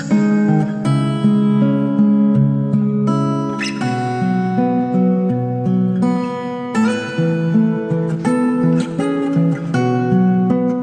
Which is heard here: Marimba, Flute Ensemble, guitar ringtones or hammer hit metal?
guitar ringtones